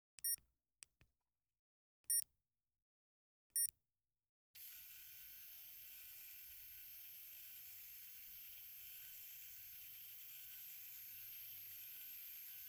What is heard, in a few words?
medical devices, insulin pump, rewind, beeps, servo, spinning; Eiravaein Sound; Vaeyan III